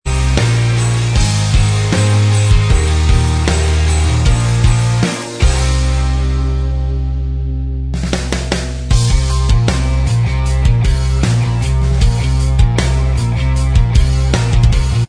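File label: instrumental, backing tracks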